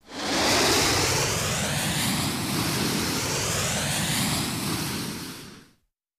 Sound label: Rocket Whoosh, whooshes